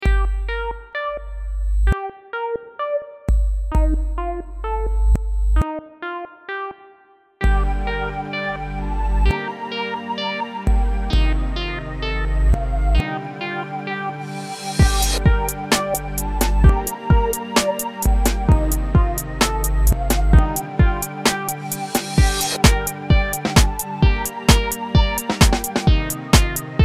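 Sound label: Demonstrator with Vocoder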